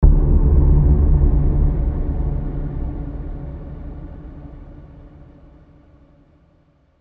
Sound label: Low Synth Ping